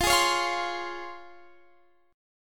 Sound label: C sharp Major 7th